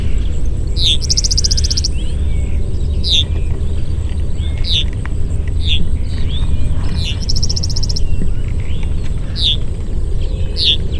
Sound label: meadowlark and grasshopper sparrow